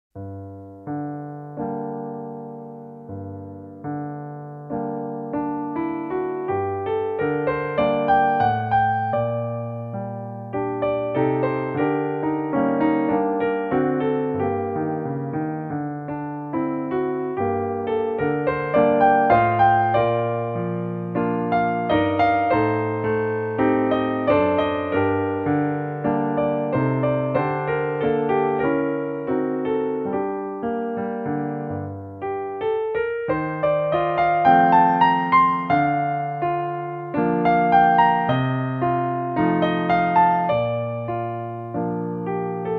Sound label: barcarolle prelude tchaikovsky classic concert grand piano; tschaikowsky; die jahreszeiten